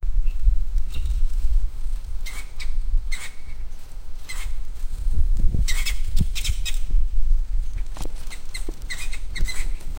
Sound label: squirrel running on a tre